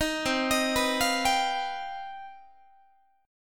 C Minor Major 11th C down Guitar 4A 4E 5A 5D 5G sharp 6C sharp; CmM11 arpeggiated; JGuitar